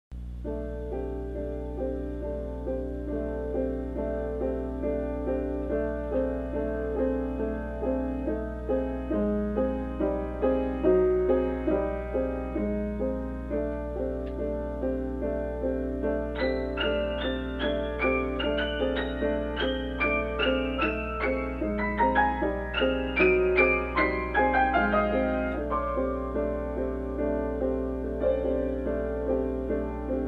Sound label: Piano Prelude